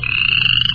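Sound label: sound of chirring of frog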